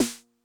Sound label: Snare Accent High Tuning High Tone Mid Snap, 909; classic machines